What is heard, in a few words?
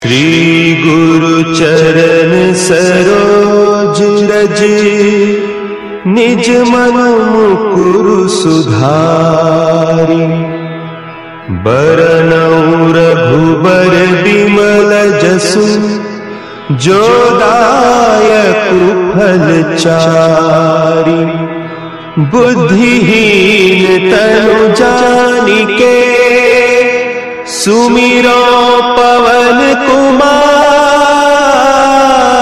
Hanuman Chalisa Melody